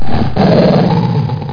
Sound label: GROWL; roc